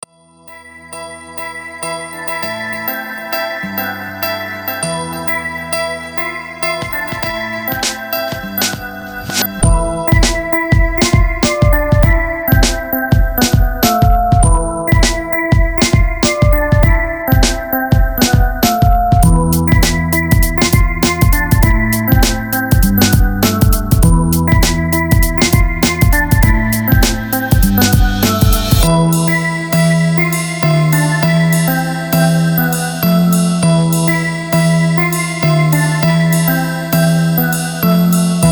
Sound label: 100 BPM